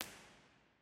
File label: Lady Chapel Cathedral; St Albans